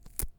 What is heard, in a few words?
Picking Flower